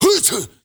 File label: MALE RAV, MALE NOISE, Heart of Africa; Spectrasonics; Heart of Africa 1